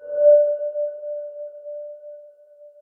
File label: projectile; smite